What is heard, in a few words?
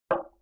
Barrel Hit Soft, Vintage Cartoon; Drums & Percussion